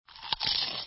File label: chomp soft; pvz